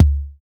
kicks

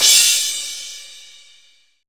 crash; East Collexion; Drum 1 Dry

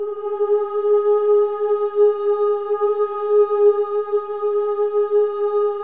BOYS OOS; Spectrasonics; Symphony of Voices

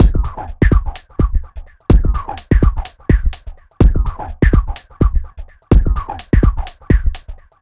HIPPOP A L; Spectrasonics; Metamorphosis

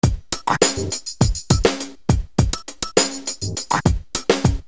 Beat; Sonic Foundry (Sony Creative Software); Infinite Soul VOL-1; Beats

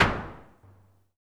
Drum X Clap; Pearl Drum-X

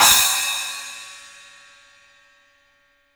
CYMBALS; 3D Sound; 3D Drums

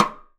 Side, Drums; Polyend; Simulacrum Sounds; 1 Shots; Acoustic and Electric

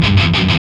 GTR; Roland - LA Composer vol. 1

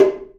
Java Drums; E-MU Producer Series Vol. 5 – 3-D Audio Collection; 3DSprints; 3DJavaDrums

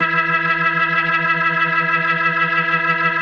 E3F, B3, Vintage Keyboards

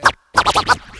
Ducky Scratch; Mo' Phatt; Digital Sound Factory Mo' Phatt

